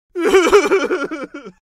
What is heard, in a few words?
father crying; little billys